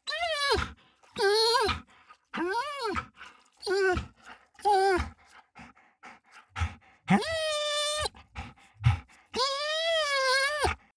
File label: dog whine heavy; natur-tiere; hunde